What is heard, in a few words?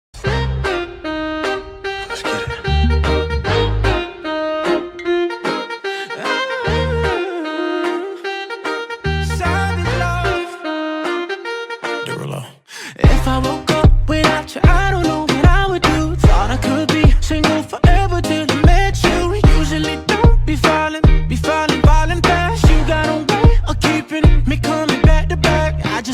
Siren Beat